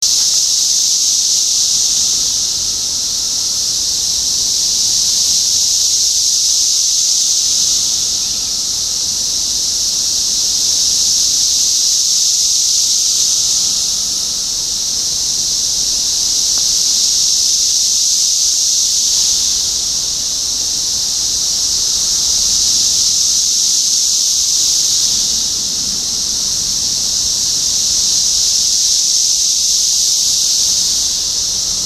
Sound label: cicadas Topeka KS